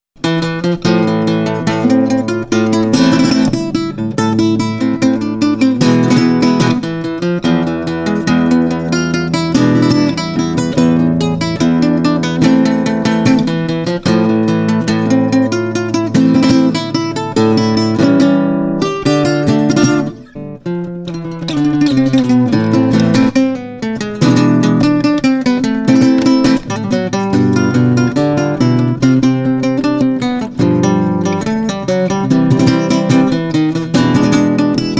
Tango

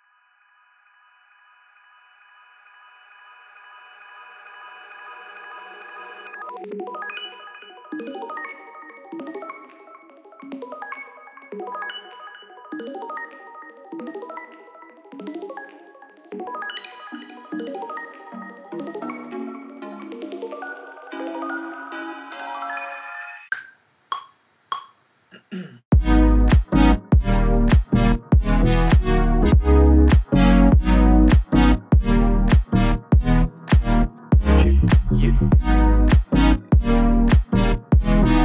Lo-Fi Downtempo House

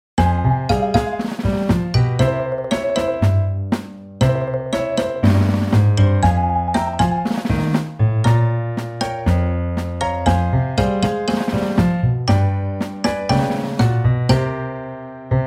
Tango Sappy Strings; Tango Waits Becomes Sappy Strings

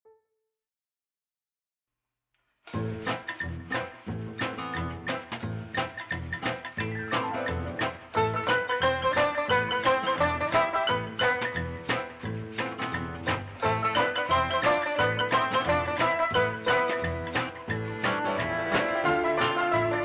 retro style folk

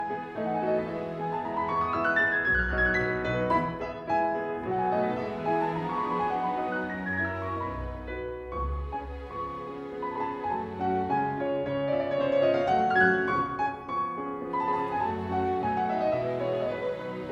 Piano Concerto No. 1 in E Minor, Rondo Vivace; Op. 11

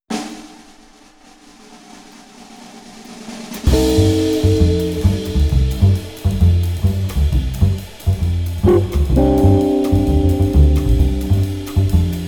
ambiance 70 jazz

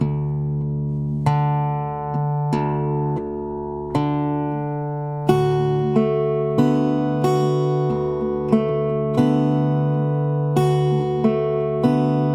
Dropped D practice slow

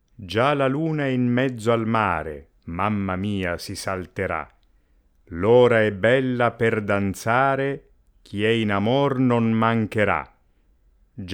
Rossini Soirées musicales La Danza Fast

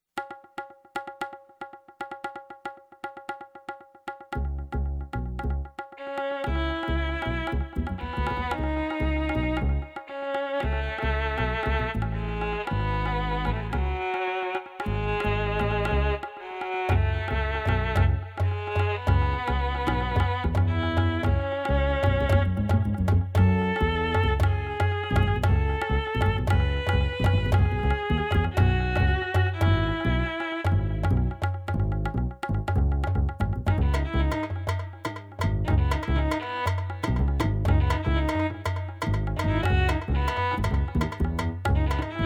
Instrumental

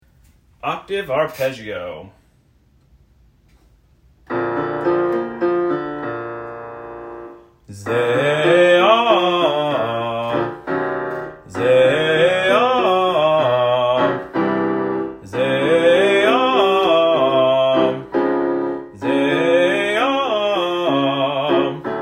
Octave Arpeggio; Tenor/Baritone Warm-ups

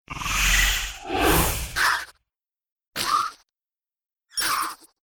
Attack Heavy VOX; Gut Eater

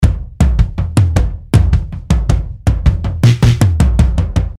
100 jungle toms loop

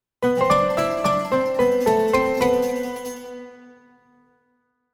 Sonic Logo

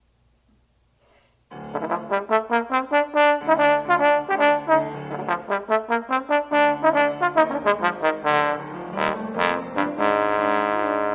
trombone and piano

